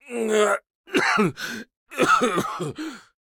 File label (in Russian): Кашель, фраза; Маккри